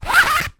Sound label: sport badminton racket cover sleeve zipper